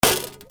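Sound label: percussion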